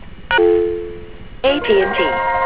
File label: boing